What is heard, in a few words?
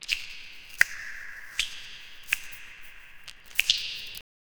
a squish slime walking, cave